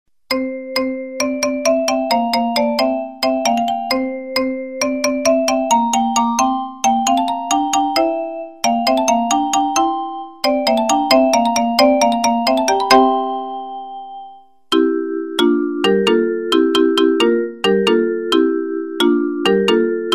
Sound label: Fanfare